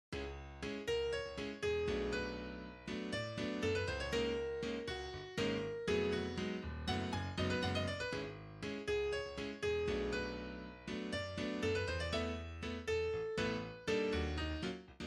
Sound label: groove negative